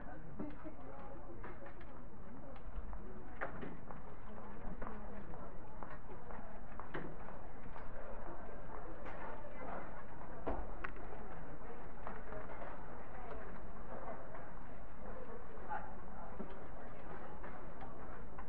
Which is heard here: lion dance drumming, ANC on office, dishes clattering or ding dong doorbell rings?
ANC on office